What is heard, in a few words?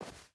footstep burlap snow run; Rust